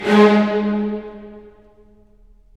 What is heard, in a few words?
STR, Roland